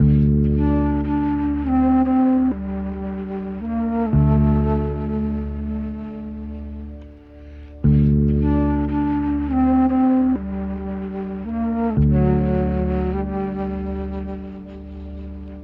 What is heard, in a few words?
flute, Loops, Sad